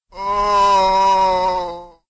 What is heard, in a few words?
zombie Hoouw